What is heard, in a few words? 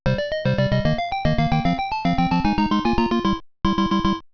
level start